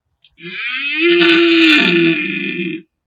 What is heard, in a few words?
the call of red stag, animal sound